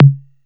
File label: TOM KIT C4, TOM SYNTH; AKAI; USB Soundscan vol.20 - Fresh Disco House I